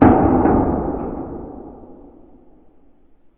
hit

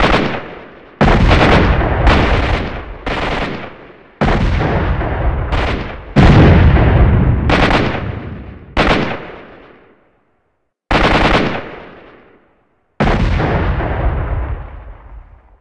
battle, ambient

